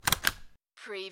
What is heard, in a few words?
stapler stapling